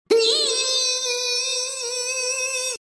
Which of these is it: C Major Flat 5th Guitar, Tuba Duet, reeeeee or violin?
reeeeee